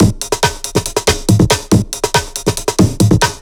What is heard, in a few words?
Breakbeat 140 BPM